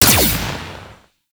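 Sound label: Igniter Pistol Projectile Explosion; Warframe; Weapons; Corpus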